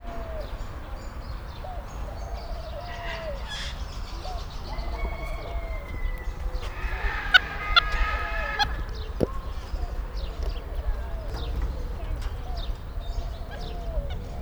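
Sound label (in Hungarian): ismétlődően megszólal, kapucinusmajom; nyiregyhazi zoo 2010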